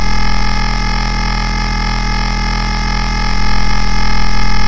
glitch

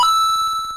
coin sound; mario

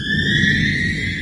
cave sound; minecraft

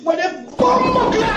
bass bossted; bomboclat

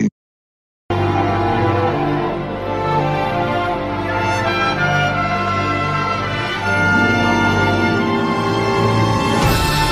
dramatic music

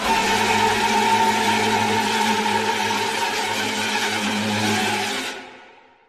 scary violins; insidious

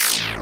slash; undertale

